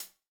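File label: closed hat, 138bpm; Zero-G Sound Sense Grime Scene; Construction Kits; Da Suspects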